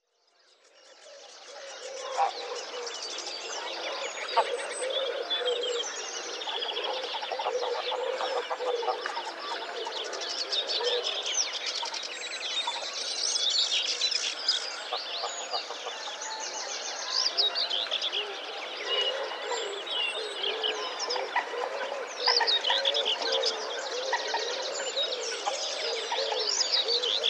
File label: fenland reed bed; purls bridge cambridgeshire